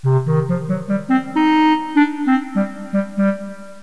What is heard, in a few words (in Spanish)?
clarine; Pipo-Aprende musica con Pipo